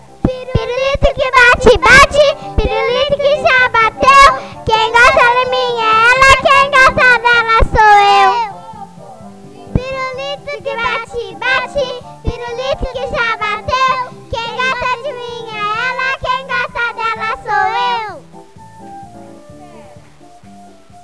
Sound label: karaoke